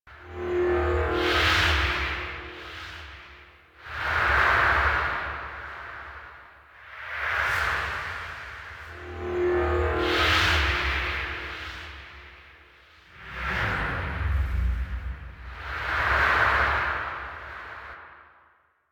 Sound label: Amphitheatre Structure Loop; Ambience; Props; Warframe; Duviri